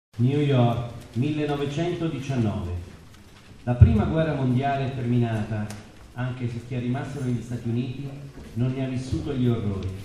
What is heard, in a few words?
intro children march